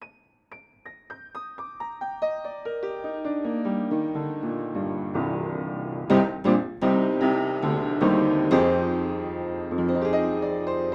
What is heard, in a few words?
upright panels on inside pair